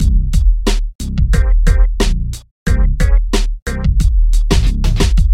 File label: Hippy Hop